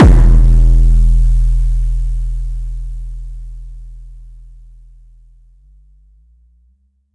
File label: boom, Kicks; ModelCycles